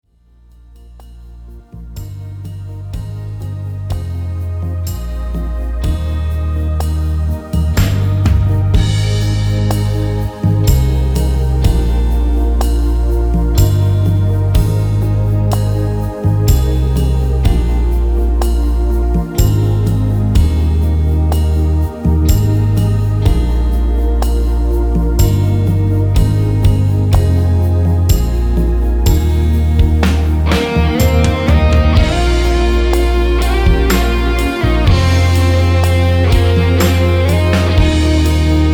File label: rhythm